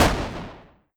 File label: gun fire lowbit